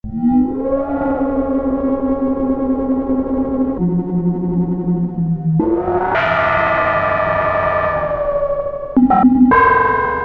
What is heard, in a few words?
Morning After, Prophet-5; Sequential Circuits Prophet-5 (Ver.3); Factory Patch; File 2 Programs